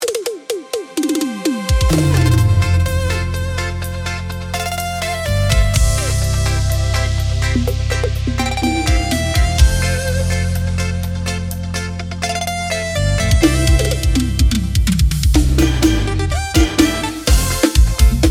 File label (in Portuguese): Brega; Netflix